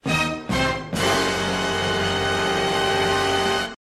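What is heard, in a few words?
dramatic drop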